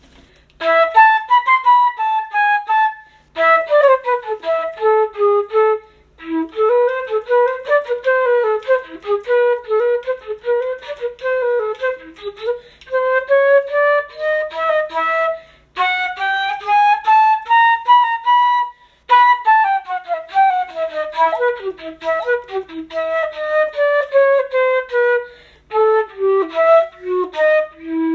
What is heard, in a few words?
Flute